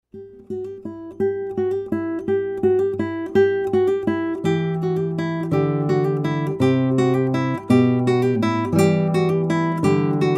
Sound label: Guitar